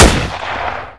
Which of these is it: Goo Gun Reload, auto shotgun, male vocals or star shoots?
auto shotgun